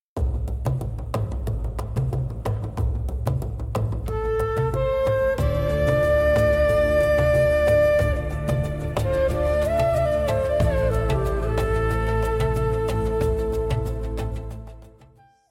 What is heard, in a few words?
Shofar Intro, shofar calls